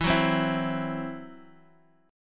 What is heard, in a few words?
E Suspended 2nd Sharp 5th E, Guitar, Half Step Down